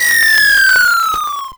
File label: grenade whistle, weapons